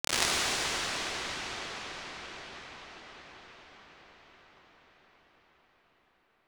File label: Big Cave, impulse responses, outdoor spaces; Large Spaces; Apple